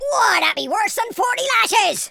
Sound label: ouch, pirate